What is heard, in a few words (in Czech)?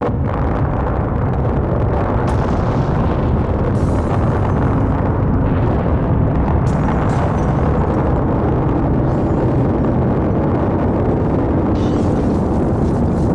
Vybuch